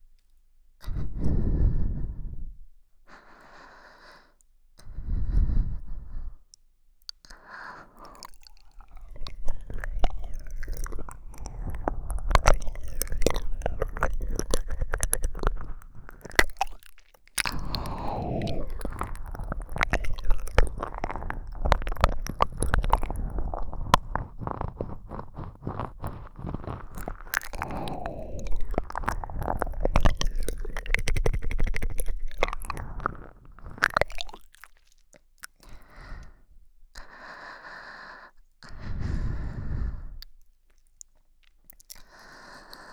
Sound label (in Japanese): 吐息耳舐めコース, ASMR